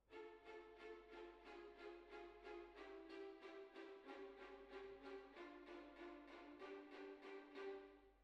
Hall

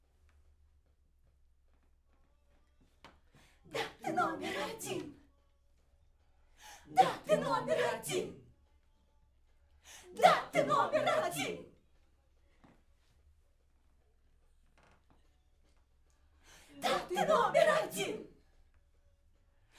Backs; SCHOEPS

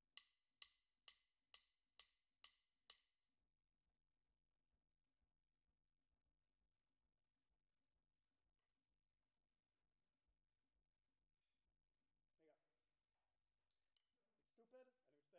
KIT

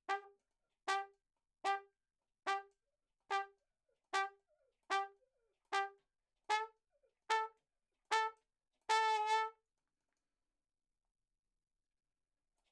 RAGTIME

